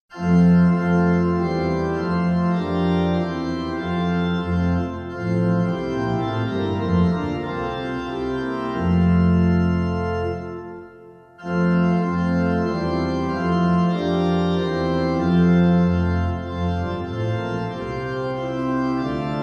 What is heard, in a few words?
Hymn, organ; Praise the Lord rise up rejoicing, Alles Ist An Gottes Segan